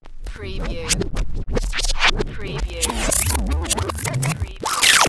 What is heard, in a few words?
Turntable Scratching Reverse